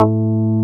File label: PLOP A2, BASSES